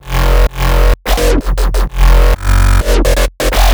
128 F Bass Loop

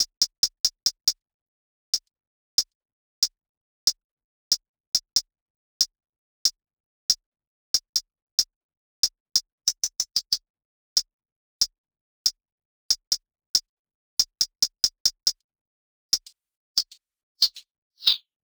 Hats loop 93

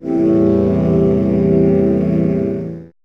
Am Brass

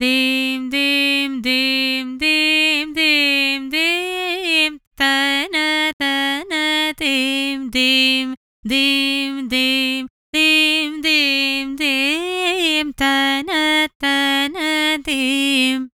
60 C Fmle Tarana

